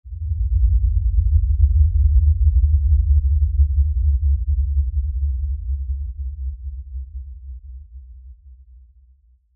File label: Lfe Rumble By Swell Low Bass Movement; Lfe Rumble Tremor Long Production Element Imaging Element Accent Transition